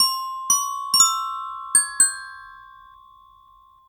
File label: Soaring Nightingale